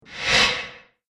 Transition Metal Swipe; Whoosh Sound Effects

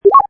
Future, Button sound; Multimedia

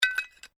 Glass move; Material components for constructing more complex sounds; Materials

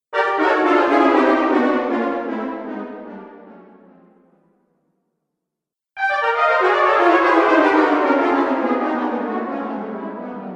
Cartoon Failure Brass Delay Collection; Cartoon Music Sound Effects

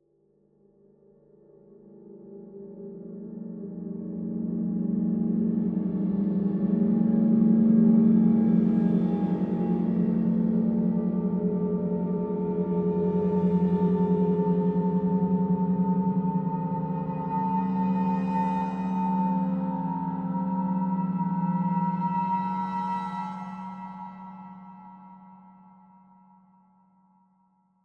Horror Cymbal Swells Ascend; Scary Tones; Blastwave; Sound Effects:Horror

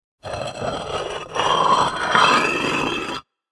Sliding Stone, Grating stone sound.; Scrapes; Objects

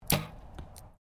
Archery Competition Bow Firing; Various archery sounds; Miscellaneous Sports

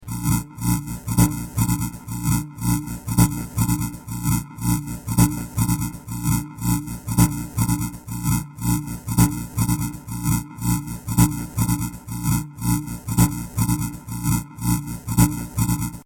Electro Matrix Loop, sci fi; Looping Sound Effect; Sci Fi Sound Effects; Fantasy, Horror And Sci-Fi Games; Andrew Fleming

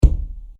Heavy Wood Door Knocking; Door Knocking (Sound Effects:Doors)

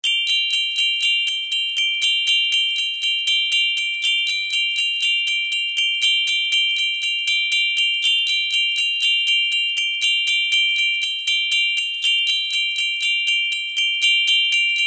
Sleigh Bells, Jingling Christmas sleigh bells; Musical

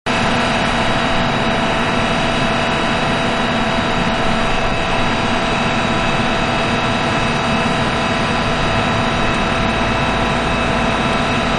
Cement Mixer At Construction Site; Backgrounds

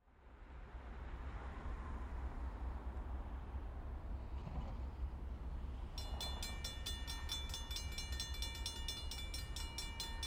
Vehicle Train Pass By Crossing Bell; Train Sound Effects; Trains (Sound Effects:Vehicles); SFX Bible 6

